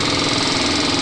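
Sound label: Boeing 777-200 stall; X-System 4.65; Heavy Metal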